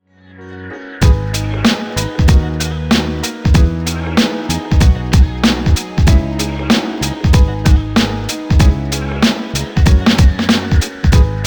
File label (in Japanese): ピアノビート